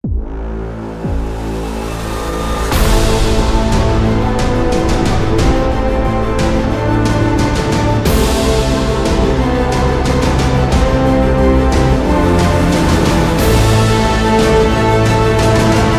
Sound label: Two Paths (No Choir)